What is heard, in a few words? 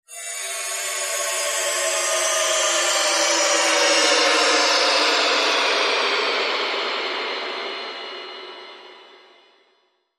Stars Beyond Downer